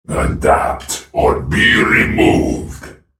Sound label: attack; Viktor; Character Voices - English; en US